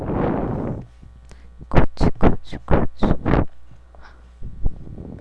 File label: cough